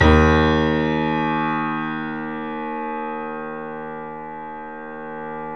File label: Small Pianos, 3-D Audio Collection